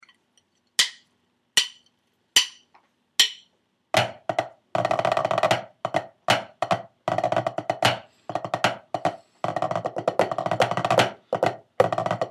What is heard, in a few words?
snare tenor